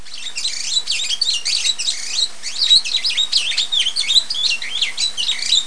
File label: air; My Science Fair